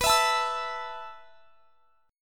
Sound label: B 7th Suspended 4th B down; B7sus4; strummed